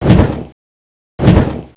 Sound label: attack fly poison; cso angra